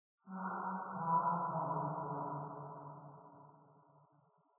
Giggle

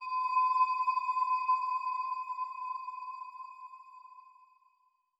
dream plant hit

